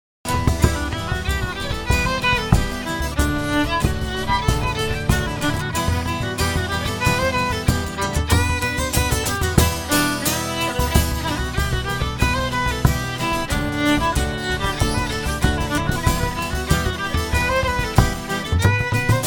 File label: HORNPIPES; The Pride of Petravore